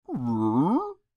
Creature Human Moan Intrigued; Groaning; Voice; Groaning Sound Effects; BigRoomSound

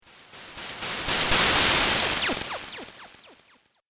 Laser Hiss Video Game

